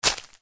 foley footstep single soft shoe forest floor dead leaves twigs; zapsplat